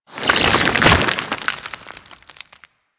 Desert Skate Attack Foley B; Warframe; Attacks

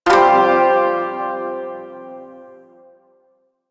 Shawzin Pent Min Chord F, Music; Chords

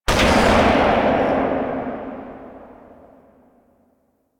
Sniper Tail, Weapons; DE; Warframe; Tenno; Quad Sniper

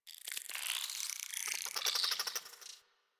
Venus Landscape Close Texture; Ambience; Warframe; Venus Open World

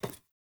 Ladder Climb Wood; Player

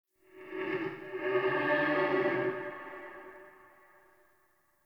Stag Vocal Pain Idle Echo

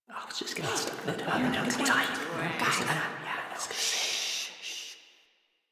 Student Confused; Cinematics; Warframe; The New War; Zariman

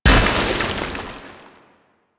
Staff Sand Attack Debris; Warframe; Duviri; Dax; weapons; player abilities

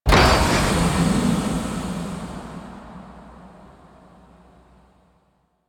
Sacrifice Symbol Puzzle Door Unlock; Warframe; Quests; Chamber

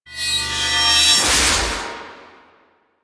Sword Heavy Attack Charge; Warframe; Duviri; Dax; weapons

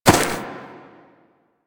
Ram Step Walk Grass; Animals; DE; Warframe; Quests; Duviri

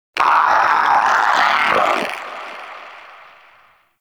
Vocal Alerted, Shrimp Lantern, Creatures; Baliq

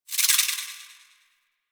Foliage Insect; Ambience; Props; Warframe; Duviri

